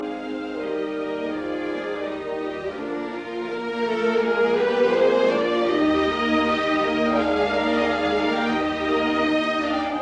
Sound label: Piano Concerto in B flat major Larghetto; Mozart; No 27; Op 17